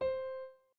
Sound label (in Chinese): 院长房间 钢琴